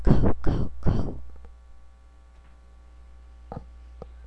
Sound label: cow